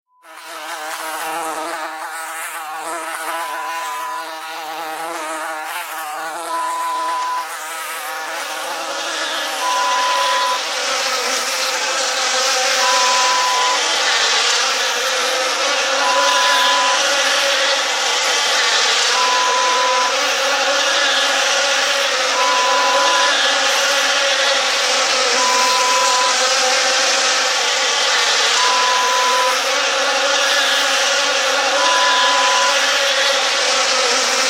The Swarm Horror Sound Effect

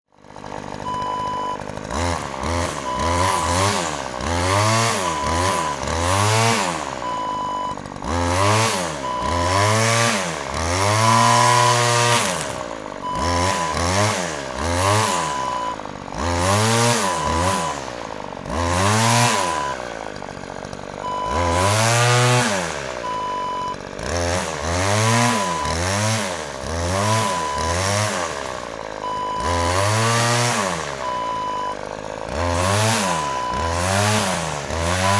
string trimmer

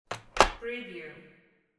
door close

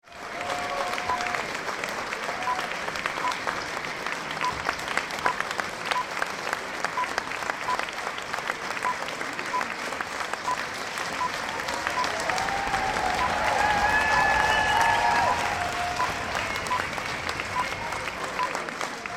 Applause

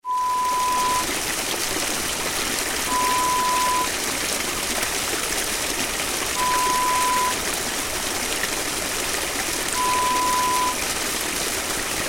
Stream sound

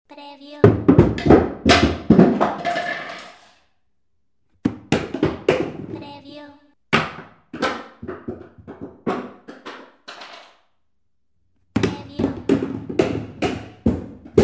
Falling Downstairs

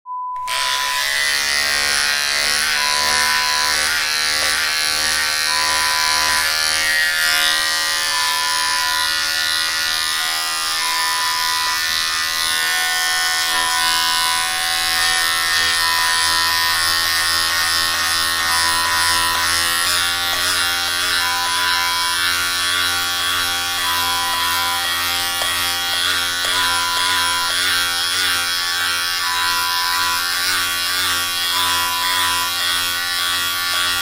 Hair Clippers sound

